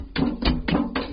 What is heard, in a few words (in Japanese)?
たたく, 生活音; 生活音系効果音